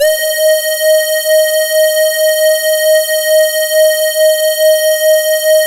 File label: SAW, Synth and Organ; InVision Interactive; Keith Emerson; The Most Dangerous Synth and Organ